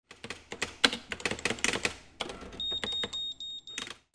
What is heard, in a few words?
OFFICE